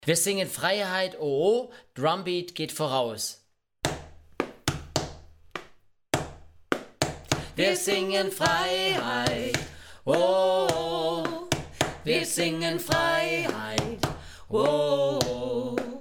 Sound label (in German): Wir singen Freiheit Oh Oh Lied